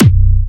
Fatterr B3, Big Room Kicks

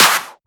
Clap; FLPRO

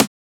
Transient; FLPRO

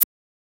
Shaker

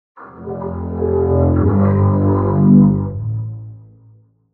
Windows XP Startup Sound in Basser, Vocoder

